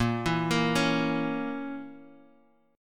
A sharp Diminished; arpeggiated; JGuitar

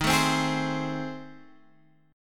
A Minor Major 7th Flat 5th

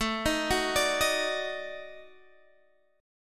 Eb Minor Major 7th Flat 5th

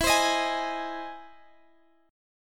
E Augmented Major 7th E down Guitar Standard; E+M7; strummed; capo=9

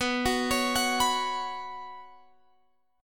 B Suspended 2nd B Guitar Open F; Bsus2; arpeggiated

